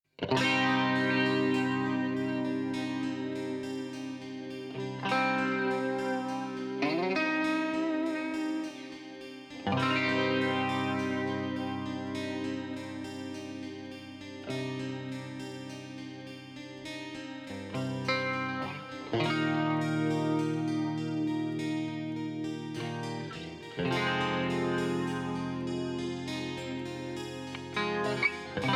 guitar extraction

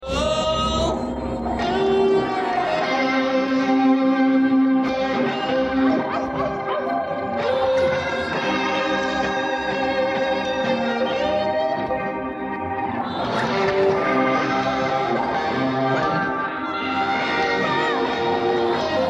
solo extraction dry

